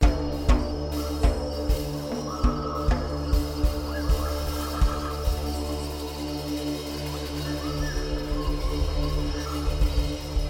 Drums

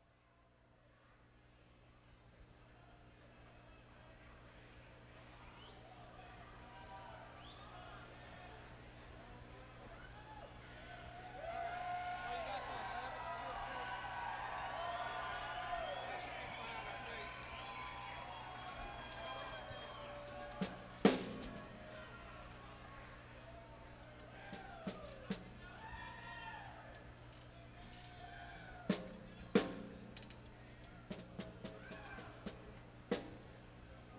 Tuning

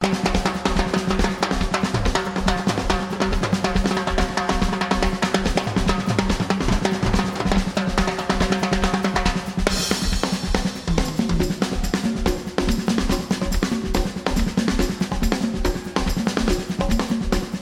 Drums

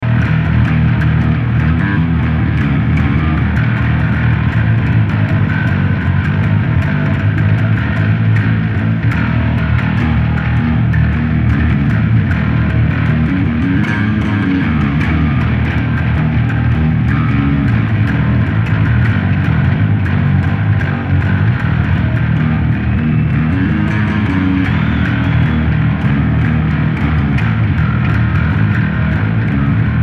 Bass Solo

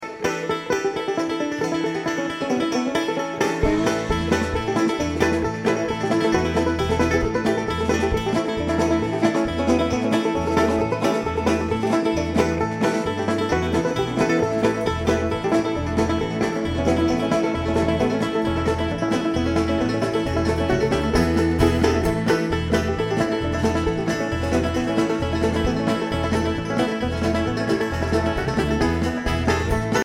Twin Banjos

